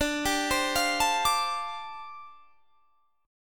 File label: G 7th Suspended 2nd Suspended 4th D